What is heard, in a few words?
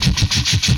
Helicopter Loop, Vehicles; retro arcade